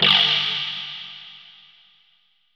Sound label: HIT NOISE; AKAI S-Series CD-ROM Sound Library VOL-5